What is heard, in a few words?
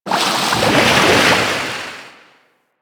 Shark Tail Move Slow; Warframe; Grineer Ocean Shark